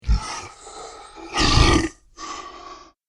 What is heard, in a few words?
Evil Beast Dying Breath, monster; Monster Sound Effect